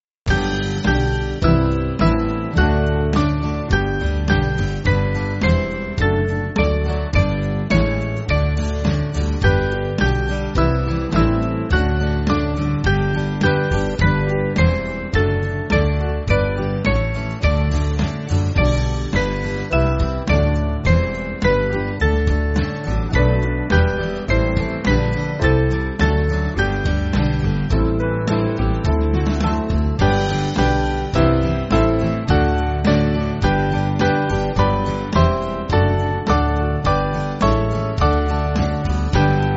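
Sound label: Band, Music Backing Files for Churches; Jesus Lord And Precious; Hela Varlden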